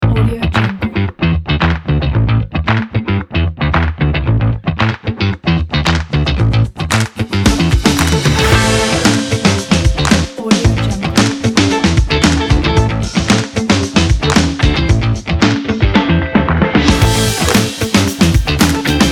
old school funk music kit

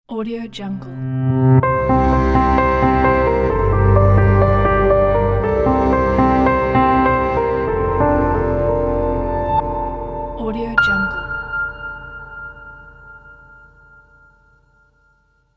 dark dream piano logo

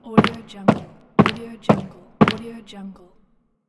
steps

